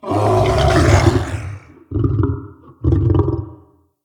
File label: Behind Wall Attack Growl; Vocals; Bromius; Animals